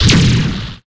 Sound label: weapon staff trail of orbs explosion